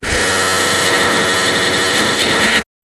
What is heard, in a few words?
jingle